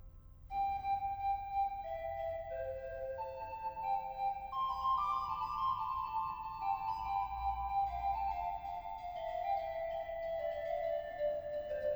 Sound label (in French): Fugue en Do